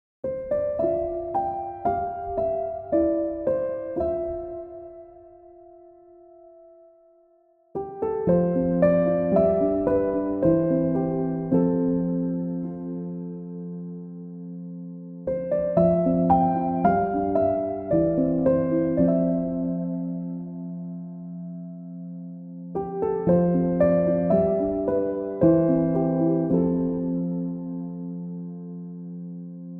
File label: piano instrumental; kimi no nawa